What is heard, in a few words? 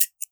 snip; townengine